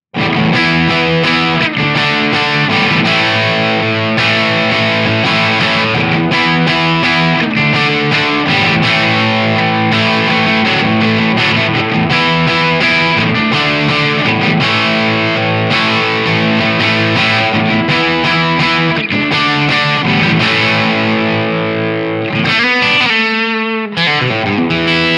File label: crunch; jensentone